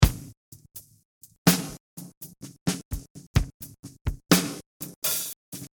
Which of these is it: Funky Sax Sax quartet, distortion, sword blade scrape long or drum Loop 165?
drum Loop 165